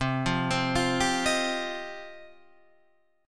F 7th Suspended 2nd, chord

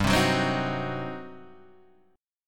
G Major 7th Suspended 4th Sharp 5th, G; GM7sus4#5 Chord {3 3 4 5 4 2} strummed

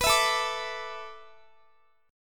A Suspended 2nd Suspended 4th, down, Guitar; Asus2sus4; strummed; 4E,4A,5D,5G,5C,6F; chordshape

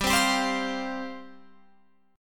G 7th Suspended 4th G down Guitar Open F

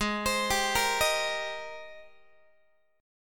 Ab Major 9th Ab Guitar; AbM9; arpeggiated; x,16,15,17,14,14

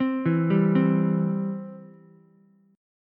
C Major, C, down, Ukulele; C arpeggiated

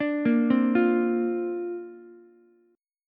D-Minor 7th Sharp 5th, D, Ukulele; 7,10,8,8; Dm7#5 arpeggiated

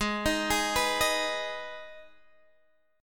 Minor, Guitar, Half Step Down; G#m; Gsharp

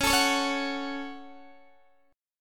Db Suspended 4th Sharp 5th Db, Guitar, Open D

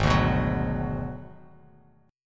Ab Minor 7th Flat 5th, Bass Standard; strummed; 4,5,9,11; JGuitar

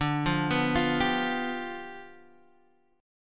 G 7th D

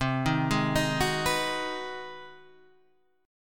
G sharp 7th Sharp 9th

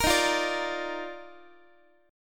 D sharp Major 7th Suspended 2nd, Guitar; strummed; JGuitar

